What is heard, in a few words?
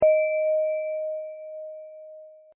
bell